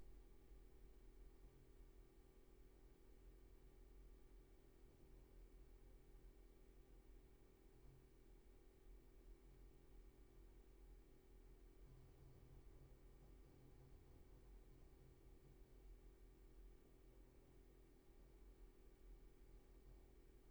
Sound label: roomtone